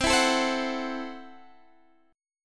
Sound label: C 6th Flat 5th, C, down, Guitar, Standard; strummed; JGuitar